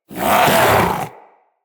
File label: Vocal Attack Short, Hunting Critters; Characters; Vulpaphyla